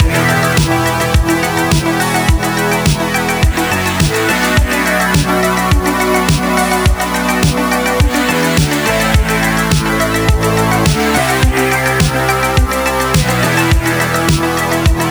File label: Horn Path Loop 105bpm; Furi; Windows gamerip 2016